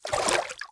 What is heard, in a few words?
Swimming Splash; MiniGames